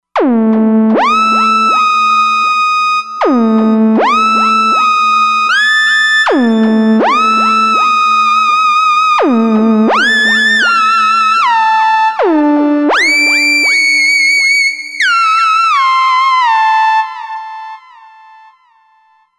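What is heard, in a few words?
Lead Rap Whistle, synth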